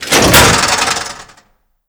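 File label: target pop up metal; Call of Duty Modern Warfare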